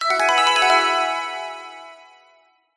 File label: multimedia alert warm bright positive event; zapsplat